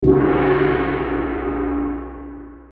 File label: Buddah Bell